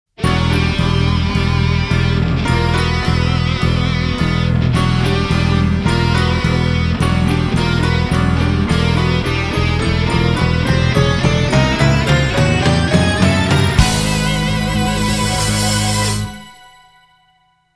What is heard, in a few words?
suspense; Initial D Sound Files Vol. 1